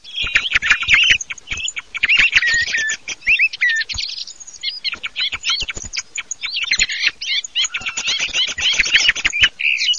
tordo

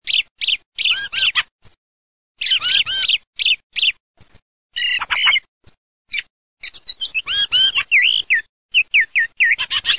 CESENA TORDO

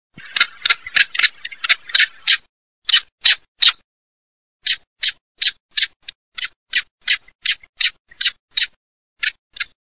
PERNICE SARDA, birdsound

